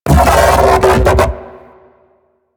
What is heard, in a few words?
Mech Ambient Vocal; Warframe; DE; Vehicles